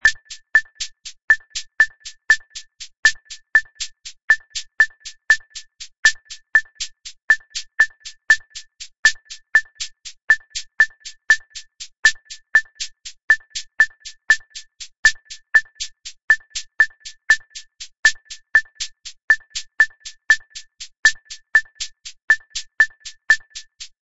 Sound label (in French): clave afro decomposee; Avant d'aborder les divers rythmes cubains, il est essentiel de se pencher sur la clave